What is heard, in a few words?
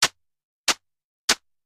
match